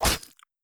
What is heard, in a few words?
zombie death; Zomboid; More Zombie Death Sounds